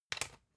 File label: usb port metallic in hard